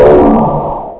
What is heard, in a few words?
gurp; openffa; EVA-01